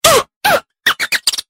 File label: nightingale